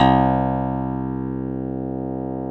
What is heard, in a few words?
CLAV C